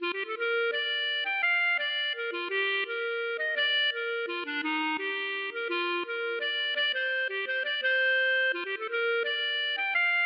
valse

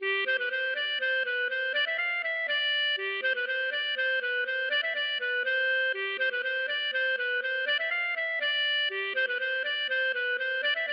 bourree

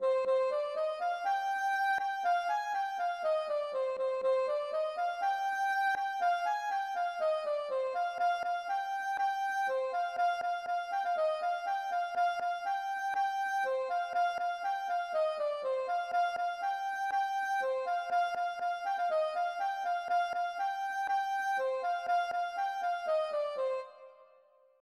gavotte Bas Leon Traditionnelle

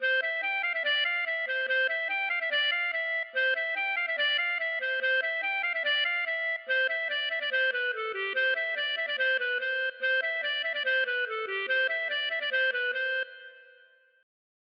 maraichine; Maraîchine : Marais breton

